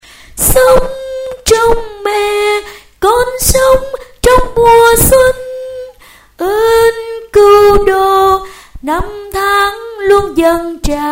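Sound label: tenor soprano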